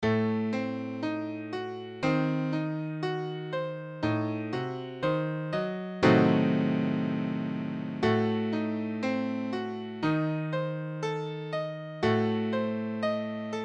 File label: Adim7, piano